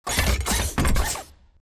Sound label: combat run turn; Titanfall 2